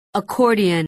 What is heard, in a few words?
accordion